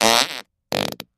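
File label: Close Up Fart Surprise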